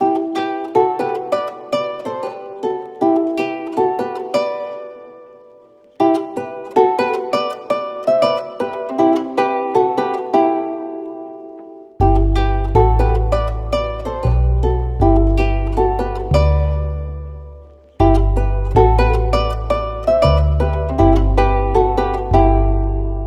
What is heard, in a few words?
AcousticGuitar